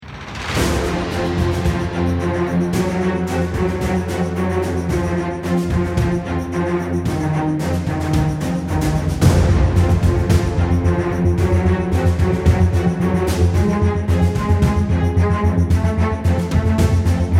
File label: bomb planted